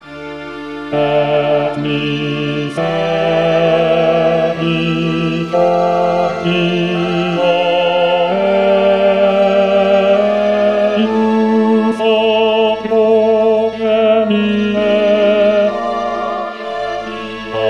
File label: bass et misericor